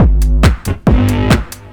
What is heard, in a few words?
drum 138, Techno, drum-loops